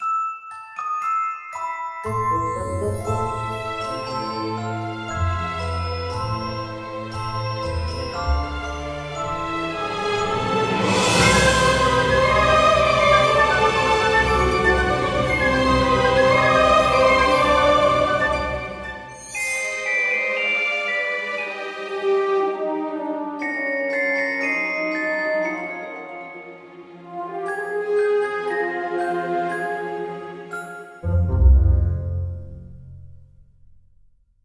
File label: Orchestral